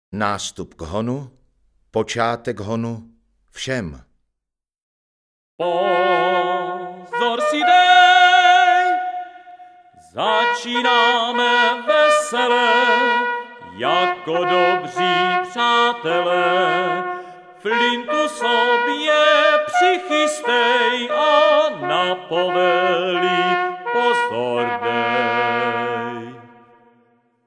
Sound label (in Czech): Pocatek honu; desatero